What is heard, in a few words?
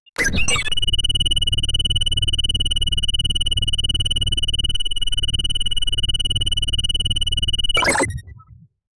futuristic ai interface s, 3d audio